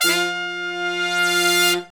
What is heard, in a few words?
BRS LONG, R&R Horns, Sect; Roland L-CDX-03